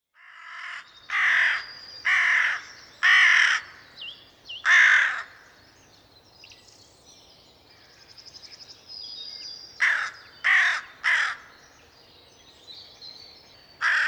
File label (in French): Corneille noire